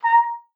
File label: A#5, TRUMPET; Best Service ProSamples vol.42 - Session Instruments; PS-42 Session Instruments